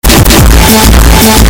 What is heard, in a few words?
very loud sound